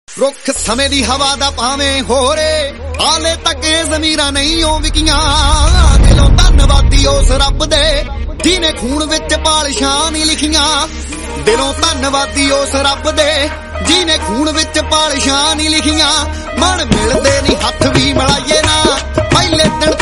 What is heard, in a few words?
Punjabi Ringtone